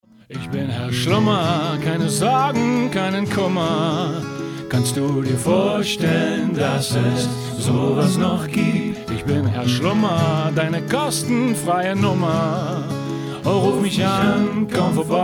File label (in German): SCHLUMMER BLUES